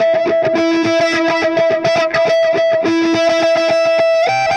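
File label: Delay Wah, Guitar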